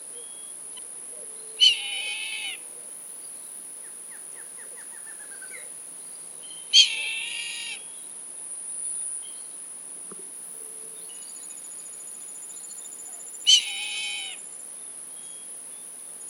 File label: Busarellus nigricollis, Moerasbuizerd; roofvogels; Accipitrinae; Accipitres; Accipitidae